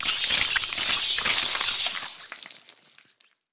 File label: Vampire Bats Attack